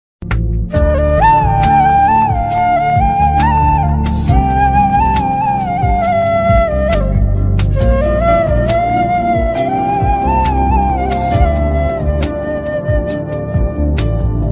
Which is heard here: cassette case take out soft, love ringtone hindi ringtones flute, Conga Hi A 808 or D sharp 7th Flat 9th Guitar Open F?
love ringtone hindi ringtones flute